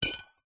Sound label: metal objects handles x2 knock together clink on carpet